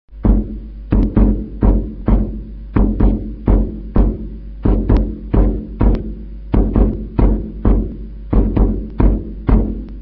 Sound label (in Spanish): bombo; tradiberia